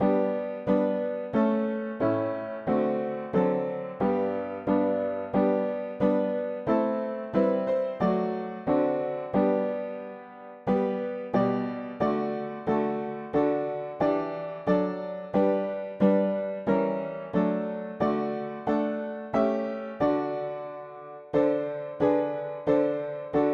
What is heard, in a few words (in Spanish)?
mono piano; hymns; Himnario pequeno